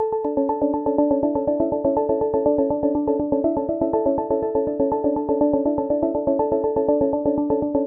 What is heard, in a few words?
122 BPM Arps Liquid Arps A maj F min Tonic A; arp essentials